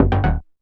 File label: Synth Shots; Vengeance Samples